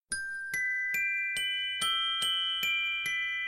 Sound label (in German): Weihnachtsglocken